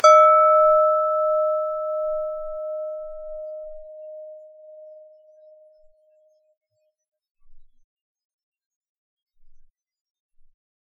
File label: Wood Hit High Glass Bowl